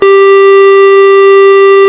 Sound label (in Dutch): snaar 3g; tuner